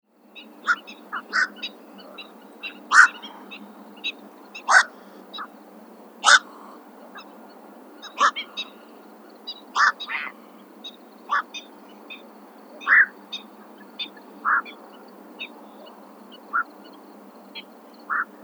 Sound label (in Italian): Sgarza ciuffetto Ardeola ralloides